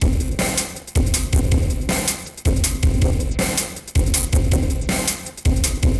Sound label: Noise Beat; Drums & Drones